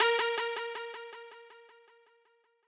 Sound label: lead